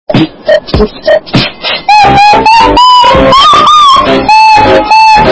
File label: flute; thomas